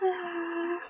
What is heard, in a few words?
snoring; sm64coopdx; char-select-extra-chars; toadette